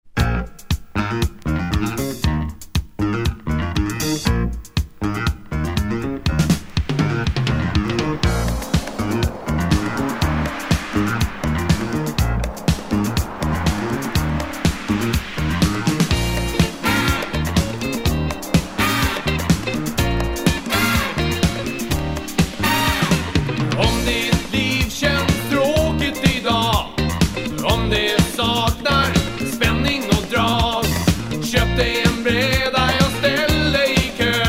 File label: sad motion; windsurfing